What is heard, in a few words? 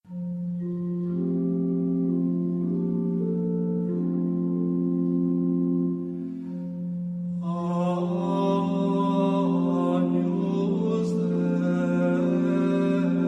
Agnus Dei Gregorian chant; thanhca; Misa; latinh; laychienthienchua